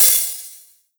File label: HiHat Open Short